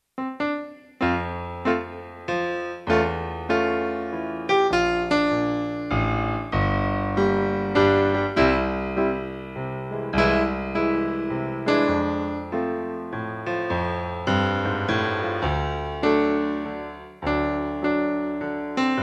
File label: Italian waltz